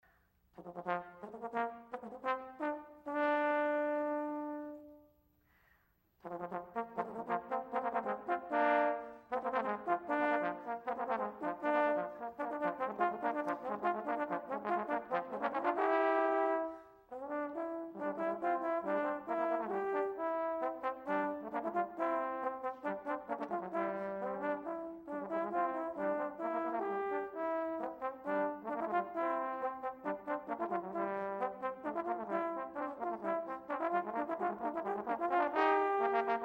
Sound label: Fanfare; 2009 concert